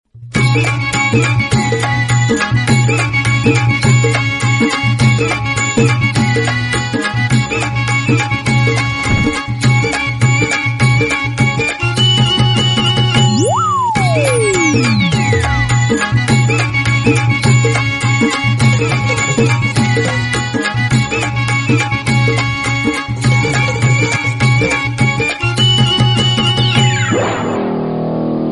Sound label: best marathi tune ringtone